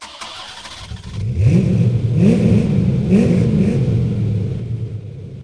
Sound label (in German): anlass